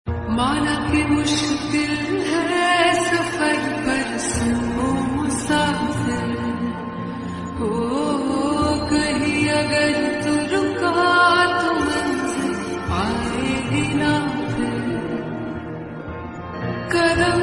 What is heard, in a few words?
New Hindi Song Ringtone